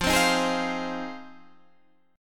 F Minor 7th F Guitar Standard; strummed; JGuitar

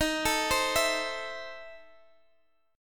E Major 7th Suspended 4th Sharp 5th D sharp

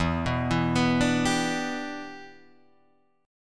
E Minor 7th, Guitar, Drop D

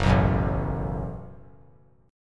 Gb Minor Double Flat 5th Gb, guitar; strummed; 6,6,9,7; 3C,3F,3A,3D

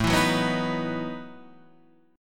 A 6th Suspended 2nd Sharp 11th, guitar; 5 7 4 4 4 7; strummed

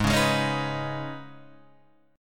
Eb 7th Suspended 4th Sharp 5th Ab

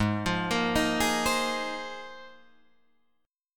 Ab 6th Add 9th Ab Guitar Drop D

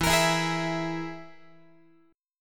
E Major Flat 5th, Guitar; EMb5 strummed

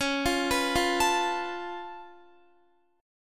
Db Augmented 7th Db down Guitar; Db+7 arpeggiated

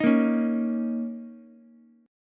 A sharp Minor Double Flat 5th, Ukulele; strummed; 8,10,9,x; JGuitar

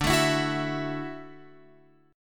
D-Major Add 9th D down Guitar Open F; strummed; JGuitar

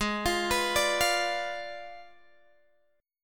G sharp Diminished 7th, Guitar, Drop D; 18,20,21,19,18,x

